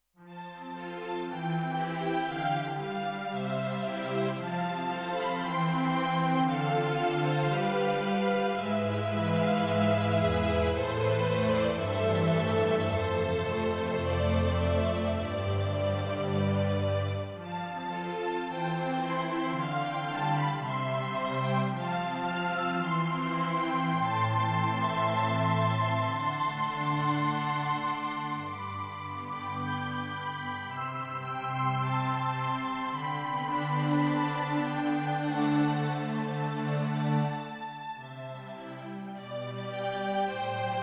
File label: Strings